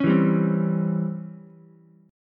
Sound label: Db Minor Major 7th Flat 5th Db down Ukulele; DbmM7b5 strummed; JGuitar